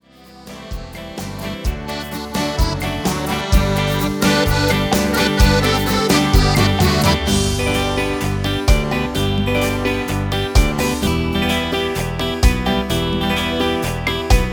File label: Shakin Ballad